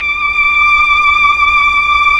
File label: STR, Melo. Violins, Tron Violins; Roland LCDP09 Keys of the 60s and 70s 1